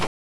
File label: Motor, Train; F40PH-2.6